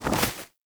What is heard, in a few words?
Bird Of Prey Wing Flap Up; Warframe